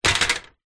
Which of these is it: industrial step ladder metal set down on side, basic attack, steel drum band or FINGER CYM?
industrial step ladder metal set down on side